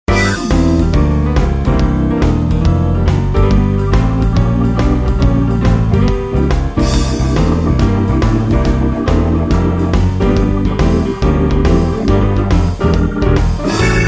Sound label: swing jazz